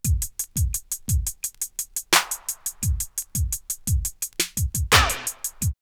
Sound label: def jam 86, drum loops, old school; X-Static Goldmine